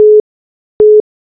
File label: Callwaiting, phonetones